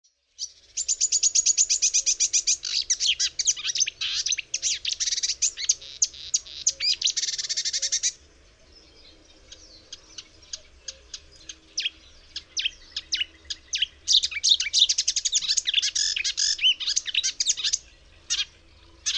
LOZOWKA, birdsounds

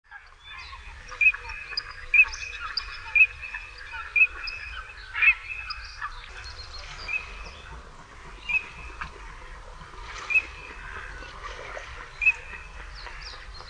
cyraneczka, birdsounds

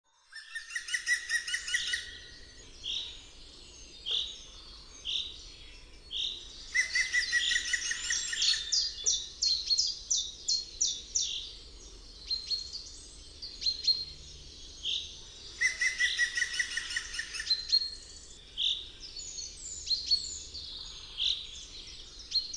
dzieciol zielony

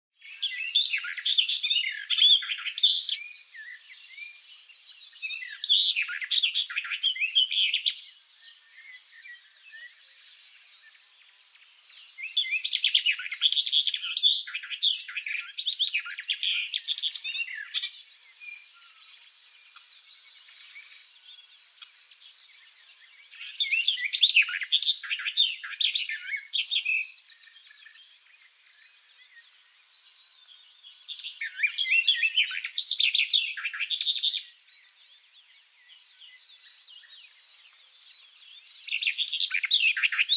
jarzebatka

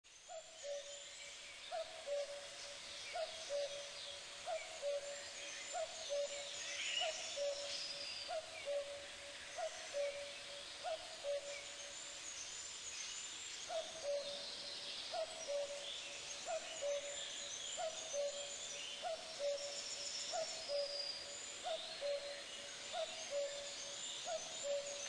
kukulka, birdsounds

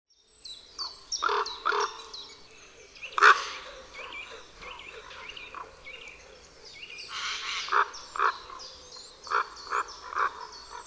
KRUK, birdsounds

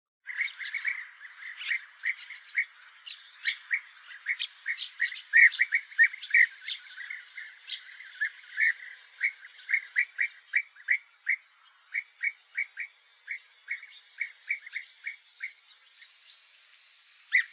zolna, birdsounds

